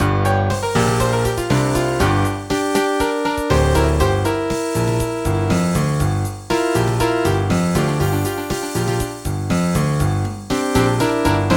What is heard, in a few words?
City Blues